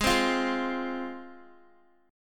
G sharp 6th G sharp down Guitar, chord; G#6; strummed

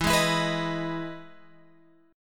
E Major 7th E down Guitar Open G

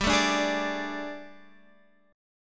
G Suspended 2nd Add Flat 9th G, chord; Gsus2addb9; sus2addb9:1,2,(5),b9; 15,12,12,13,15,x

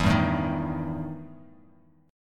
E Minor Major 7th Double Flat 5th E Bass Standard

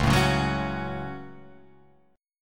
Db Suspended 4th Sharp 5th, Guitar Open F; strummed

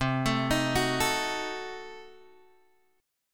C Diminished 7th C, Guitar Open G

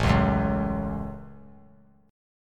A 6th; strummed

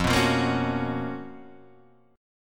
C sharp 13th F

